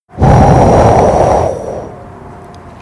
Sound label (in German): simulierter Wind